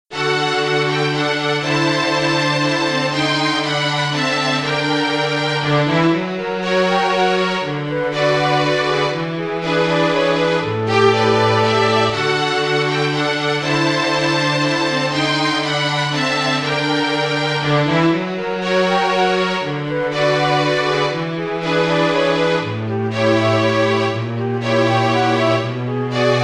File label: bluegrass waltz